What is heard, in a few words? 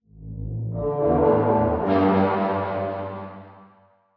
C Synths, One Shots; Keys & Synths; Touch Loops; BlissedOutBeats